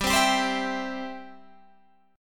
E-Minor Sharp 5th

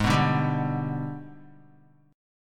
Gsharp Minor Major 7th Flat 5th, Guitar; strummed; 3,2,2,0,2,x; 4F,4A,5C,5G,5C,6E

